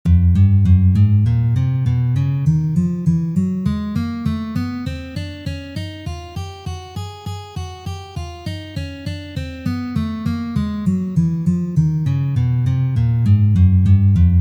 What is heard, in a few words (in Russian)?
Uprazhneniya dlya gitary